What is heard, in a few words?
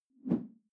whoosh simple short tonal low